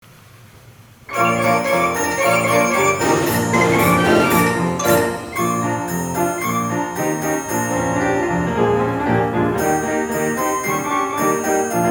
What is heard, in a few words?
Blues